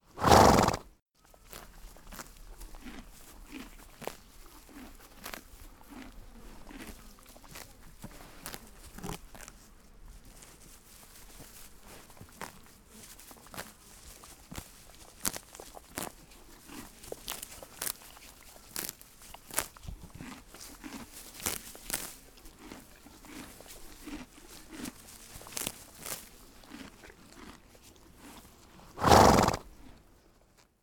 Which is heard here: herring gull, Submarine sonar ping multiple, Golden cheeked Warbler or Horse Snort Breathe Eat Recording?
Horse Snort Breathe Eat Recording